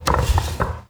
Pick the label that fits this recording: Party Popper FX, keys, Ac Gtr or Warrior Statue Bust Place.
Warrior Statue Bust Place